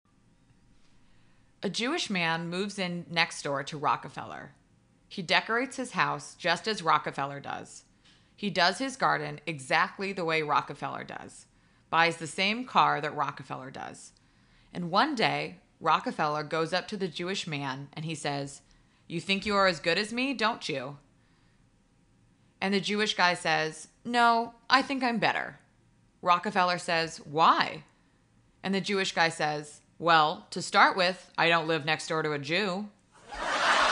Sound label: Laugh Track; Alan Zweibel; Joke